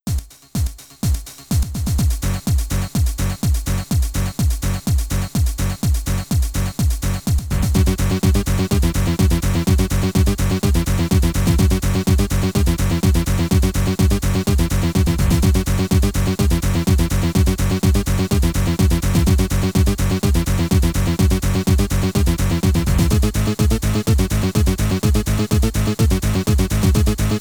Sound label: Loading Tune; T-Racer; Amiga